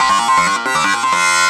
LOOP FX, Drum & Bass, 160 BPM; Ueberschall; Drum & Bass Resonance

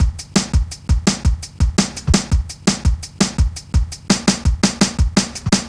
Step Ez, Drum Loops, Jungle, 168.5

